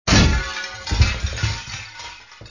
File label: texture glass